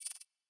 Ui Sector hover Stereo

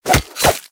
Fight Attack Lance; Mighty Party; Android

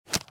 arrow wood stone

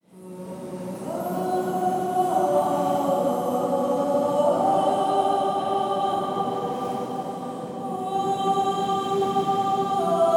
Vocals; Ace Combat X2 Joint Assault; PSP; Orgoi

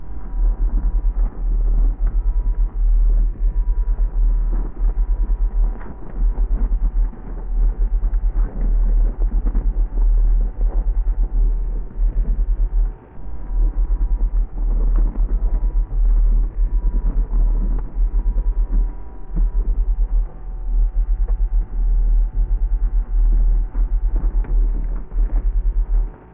Space Ship Rumble Loop; we are the dwarves gamerip 2016

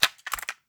Cassette Rattle

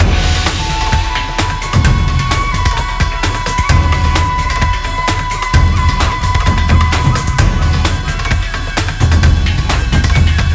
music quiet; Super Samurai Rampage android ios mobile windows gamerip 2017

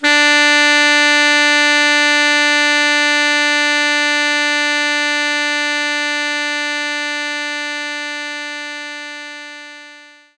Tenor Sax D3

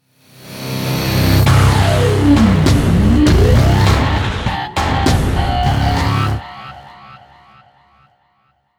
level up stinger; crackdown

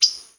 waterthrush

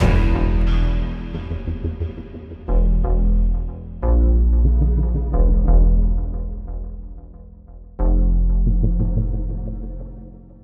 Fail; zombotron

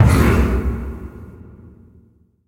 Music

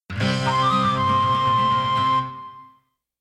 App Jingle; Dillon's Rolling Western The Last Ranger

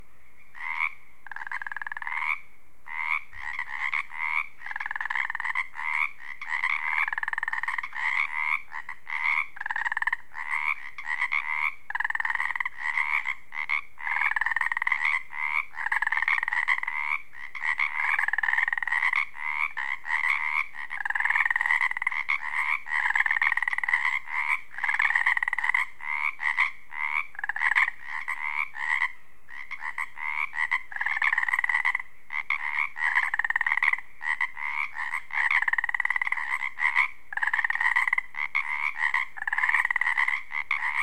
Wetland pac chorus frog chorus; children of morta; Tutorial

